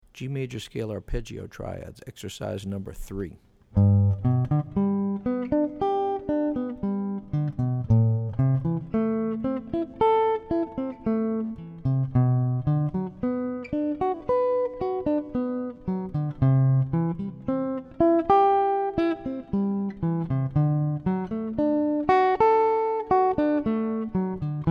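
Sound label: G Major Scale Arp Triads; lead skills materials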